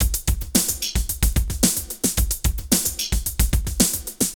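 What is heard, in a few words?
drum loops, right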